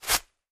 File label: Footsteps Female